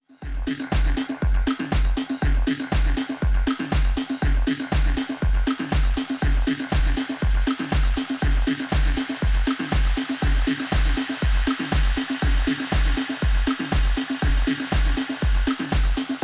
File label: Tape Delay